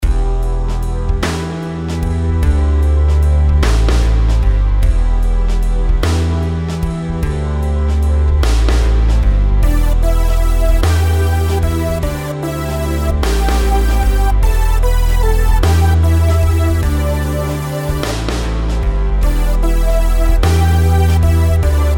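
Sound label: melodies compound 8s E minor; melody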